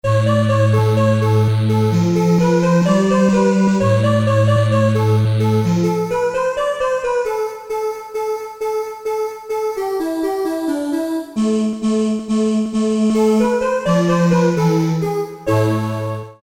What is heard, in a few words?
EL CHOIR; Korg DSS-1; Voices